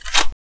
bolt, weapons scout

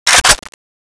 MK14 bolt pull

